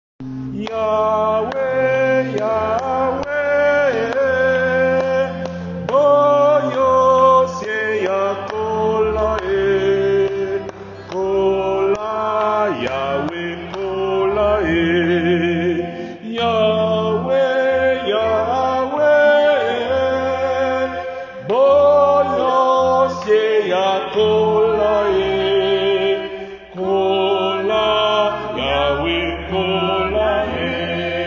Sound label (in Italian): Yahwe Kola soprani